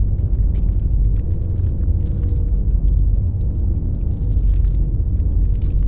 pro ship colossus idle; biogenesis

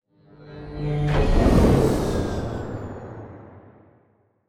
ship small ship move; synthqueen; Stellaris; machineage

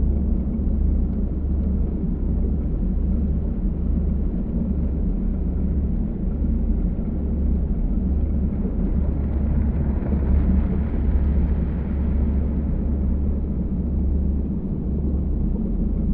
ship research station idle; aquatics

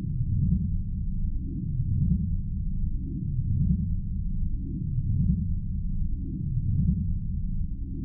sfx voidspawn idle; Stellaris; distant stars

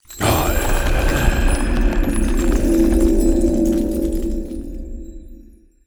lithoid angelic; lithoid portrait; Stellaris

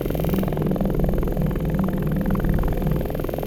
gravity snare idle; Stellaris; grand archive; ships

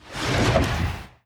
moving out transport; Stellaris

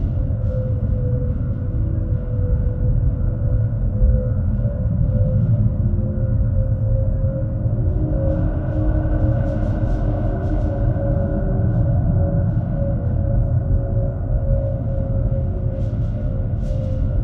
dyson sphere destroyed; Stellaris; utopia

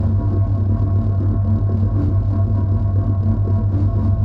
colossus shatter beam; apocalypse

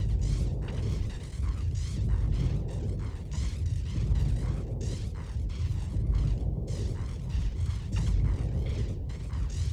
space cloud idle; Stellaris; other entities

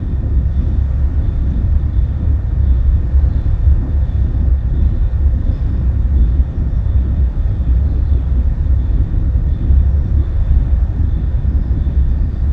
muzzle hum low; star eater; Stellaris; nemesis

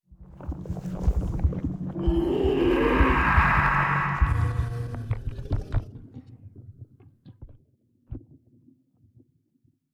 space dragon death; Stellaris; reanimated

